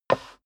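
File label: step dress boots wood creak slide short, footsteps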